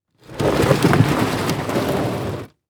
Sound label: Impact Treasure Chest Drag On Dirt